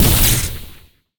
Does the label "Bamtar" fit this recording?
No